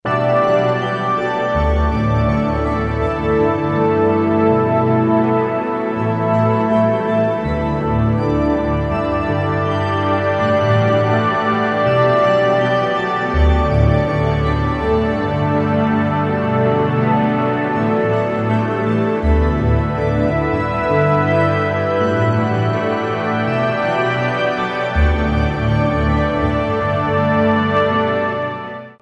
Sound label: quiet ringtone; ringtones